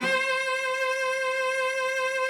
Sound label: C5, cello vib; Xsample VOL-7